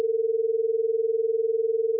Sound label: 440-460 beat